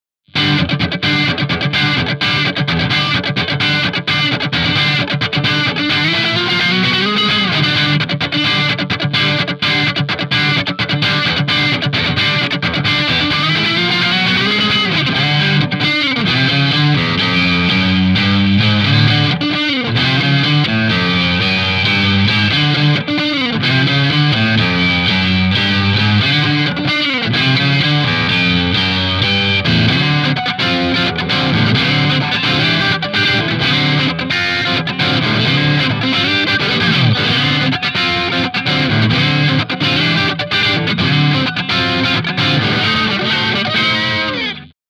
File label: funk; ampvergleich